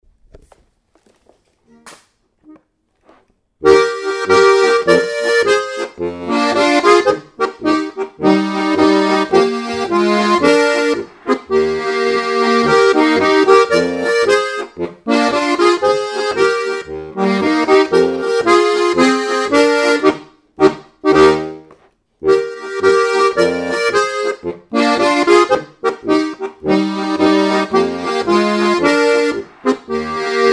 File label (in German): Polka